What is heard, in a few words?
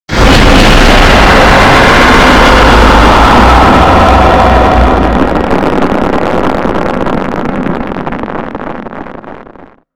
jumpscare; black sprunki